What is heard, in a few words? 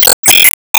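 Enemy Hourglass Minion Eyeflash; disney star smash android ios mobile gamerip 2020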